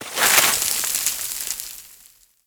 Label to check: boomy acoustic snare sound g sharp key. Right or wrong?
Wrong